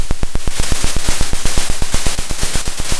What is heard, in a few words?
Sound of a drum roll